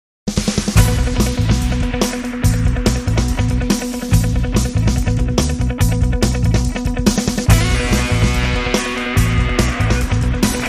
Indie Action (Rock)

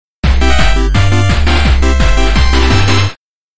Wins Jingle

